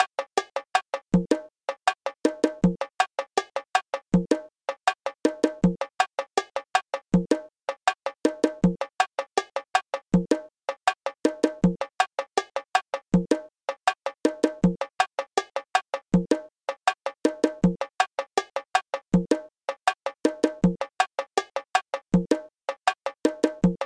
son bongos

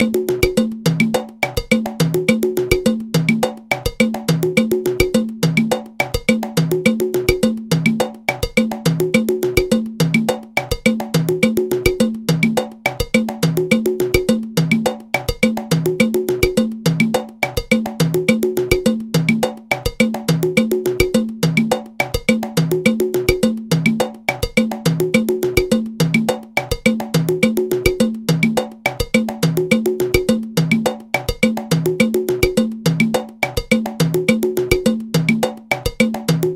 merensongo

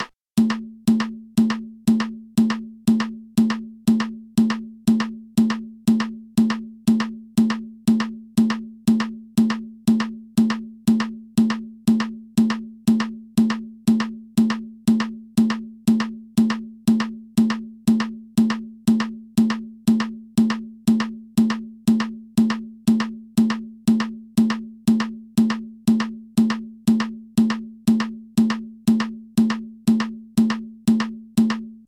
yuka mula

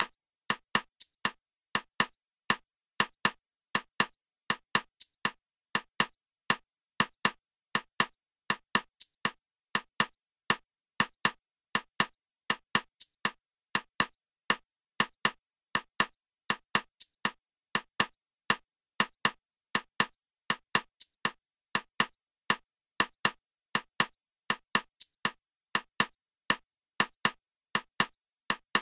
yambu habana guagua

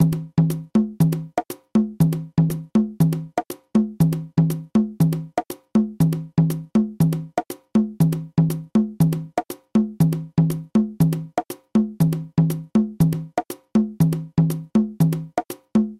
guaguanco matanzas tumba

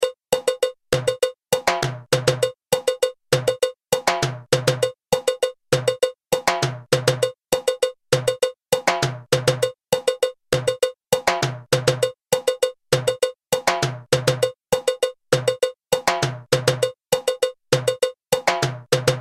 pilon timbales

